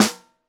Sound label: SN1 Bottom, snare